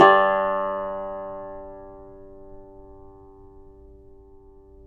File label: yayli tambur; Spectrasonics; Hans Zimmer Guitars VOL-2